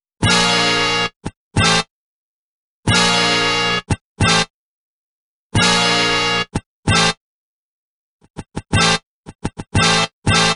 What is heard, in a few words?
Horns; Cinematic Funk; Sonic Foundry (Sony Creative Software); Urban Tone Poems